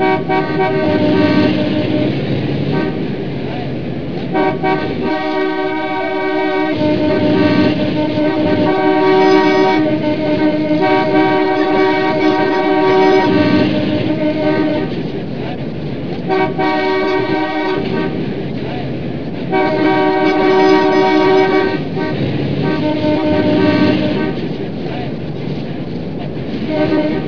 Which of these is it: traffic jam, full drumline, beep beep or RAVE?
traffic jam